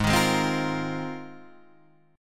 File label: G sharp 6th Add 9th G sharp